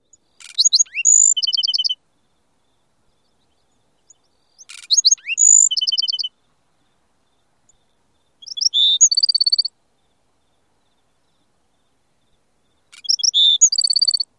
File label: songs